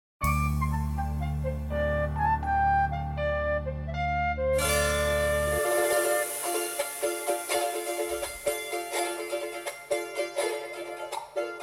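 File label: play referencia violin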